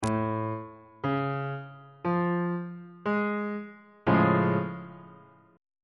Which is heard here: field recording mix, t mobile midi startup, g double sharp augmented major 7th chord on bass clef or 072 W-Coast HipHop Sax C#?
g double sharp augmented major 7th chord on bass clef